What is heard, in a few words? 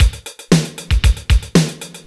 Roland D-20 16 Beat 116 bpm